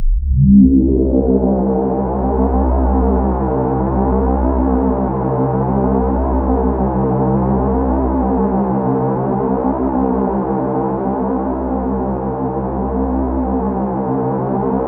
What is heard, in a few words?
C1, Synth Line; 90 s Sample CDs; NiceBeats; SynthLine VOL-18